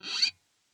scrape, glowly bass

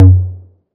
tom low, drum machines, 808; 808-mini

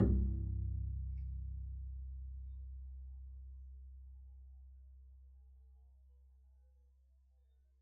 pizz c1, double bass

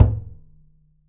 perc, pizz; meatbass

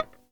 eb5 release, glowlybass

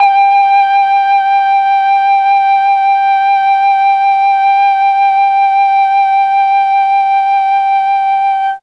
G3 mellotron FLUTE

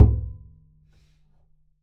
perc, pizz; meatbass

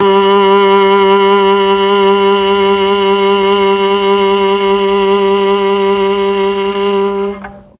G2 viola, mellotron

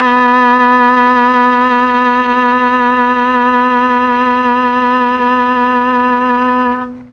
B2 viola, mellotron

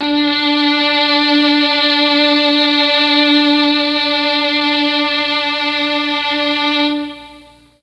D2 3 violins, mellotron MKII

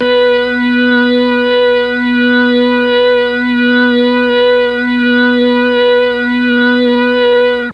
B2 organ, mellotron MKII organ

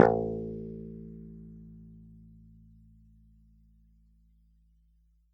a2 fff, swagbass

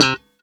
c4 staccato, glowly bass

